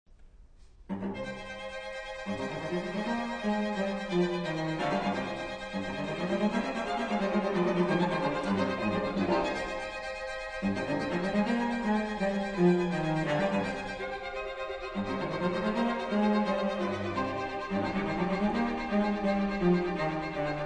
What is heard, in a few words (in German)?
Streichquartett c-Moll Scherzo; Hans Rott; Mainzer Streichquartett